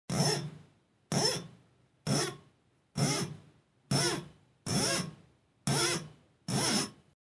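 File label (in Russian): звук трения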